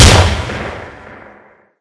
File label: glock; blackshades